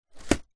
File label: basket picnic hamper lid close; household